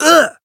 Voice damaged

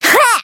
Voice attack; nekoko

